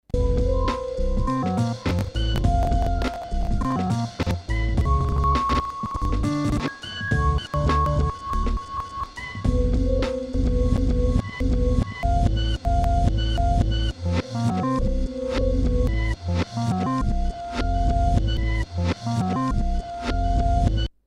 Numark v7 reverser

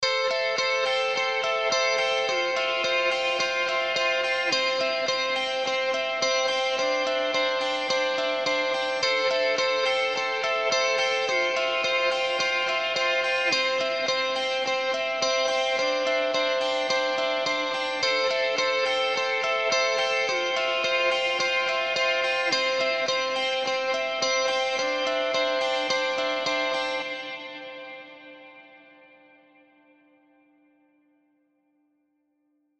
reverb loop Blackhole; Eventide

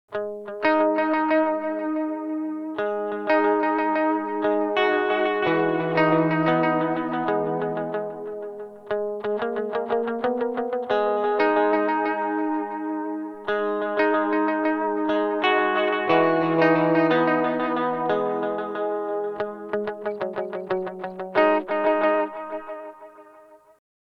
Drum Echo; Source Audio Nemesis

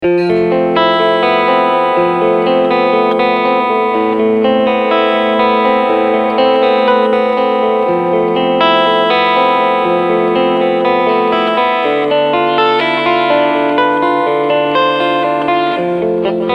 Reverb

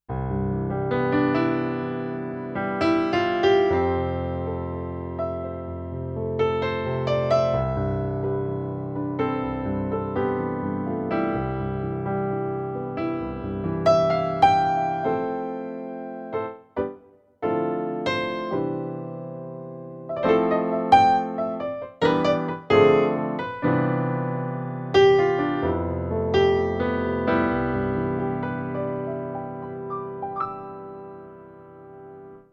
Yamaha CLP575 Bösendorfer Piano

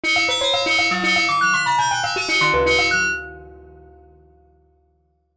RM Bonk

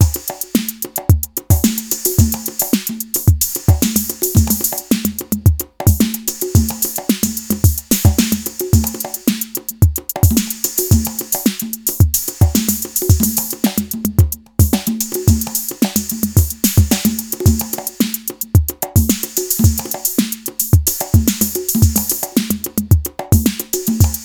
Toraiz Squid Groove Bend

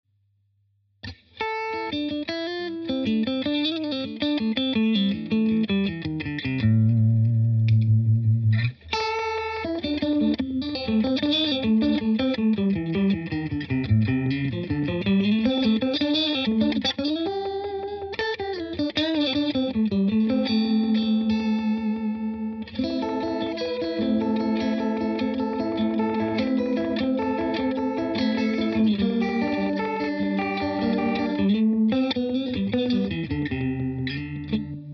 Crazy Tube Circuits Stoned Hz

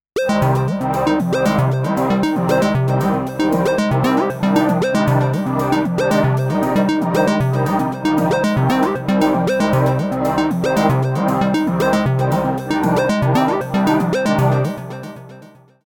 digitone

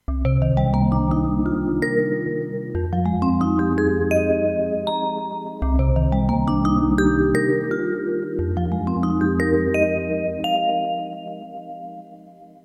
Stereo Vibraphone

